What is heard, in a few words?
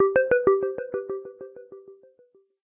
Sinusoid, notifications; Honor; X7a